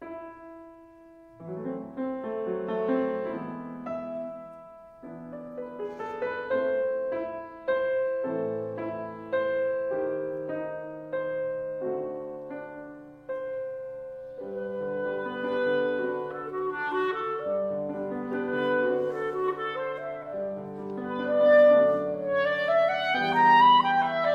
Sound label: double concerto clarinet viola; bruch